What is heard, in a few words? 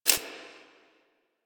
Screen Glitch; Warframe; Mini Games; Caliber Chicks Minigame